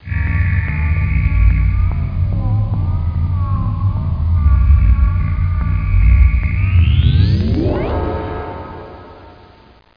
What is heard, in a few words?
Wierd